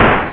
puck; Amiga Soundtracker Sample Packs

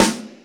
canon snare; Greytsounds; Scott Peers Sound Engineering

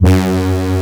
horn G1, synthesizer horn; AKAI S6000 CD-ROM - Volume 1

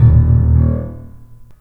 THUD, The Piano; InVision Interactive

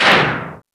BLAST; East West; Global Sounds

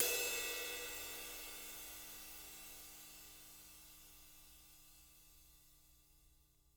rides; JZ RIDES; Living Drums; Sampleheads; Peter Erskine

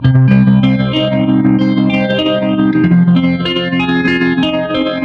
Guitars B, 95bpm, analogue circuit; musicradar

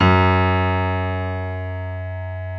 PF STB F#1-L, GRAND PF

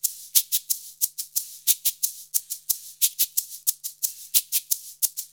90 MIX, Percussion Loops

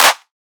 drum clap saturated; Virtual Riot; Drum Hits

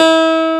CLAV A3; Keys & Pads; AKAI S6000 CD-ROM - Ultimate

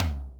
606 LT; Crypton - DrumBase5000; TR-606

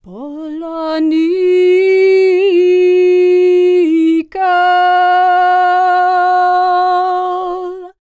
SERBIA, Vocal World Voices SERBIAN

